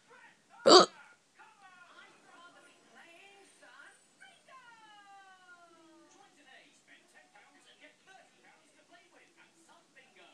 me hiccuping ribbiting

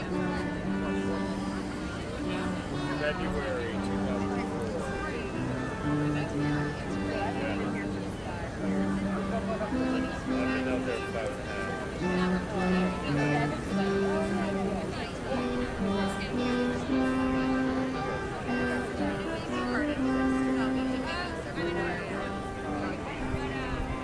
no tuba but still nice

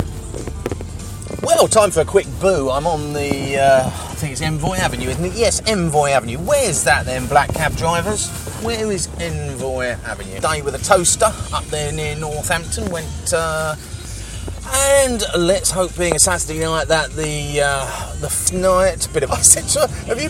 terminal 4 heathrow

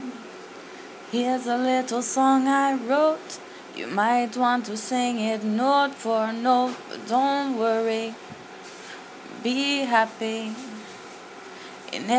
little short acapella

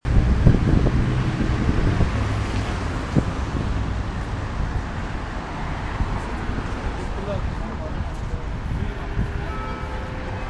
six o clock at a tube stop bongs

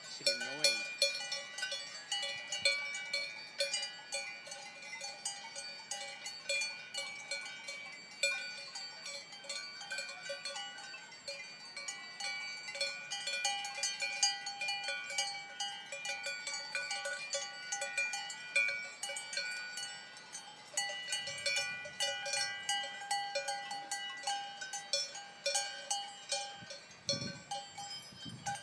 cowbell

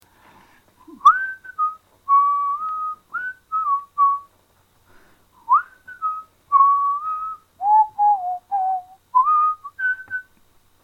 monkey whistle

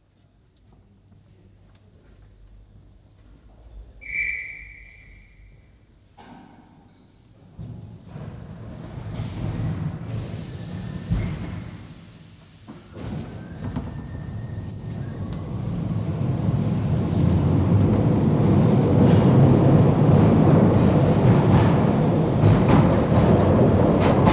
Glasgow subway the sound of the 1898 cars

